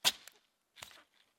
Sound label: Stab And Pull Out